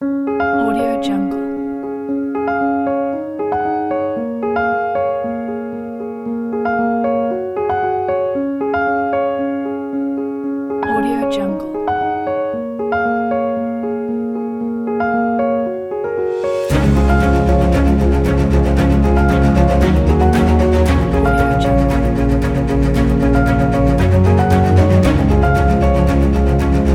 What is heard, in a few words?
a motivation piano music